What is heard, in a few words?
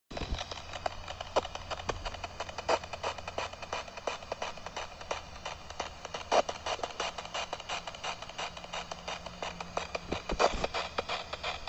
noaa15